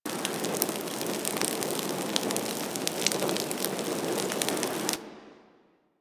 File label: Fire Ball Charging Loop; Warframe; Ember